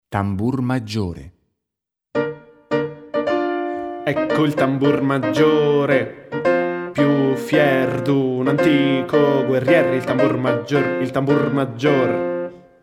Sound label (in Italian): Tambur Maggiore Ritmica